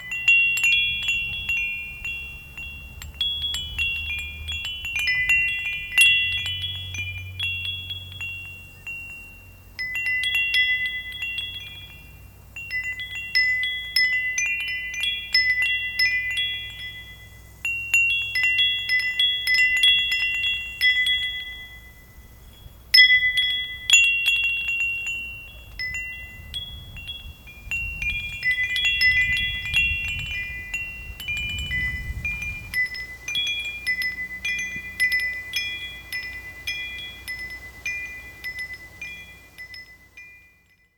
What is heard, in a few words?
Wooden Windbells On Wind